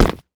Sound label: land tile, footsteps; player